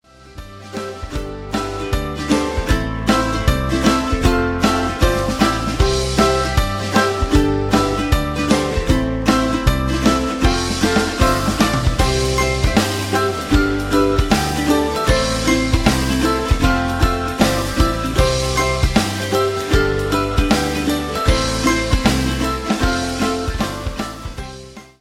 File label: music